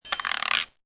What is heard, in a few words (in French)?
CLIC